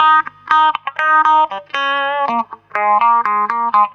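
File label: guitar, Roots Disco; USB Soundscan vol.32; AKAI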